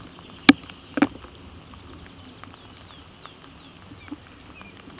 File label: Anthus trivialis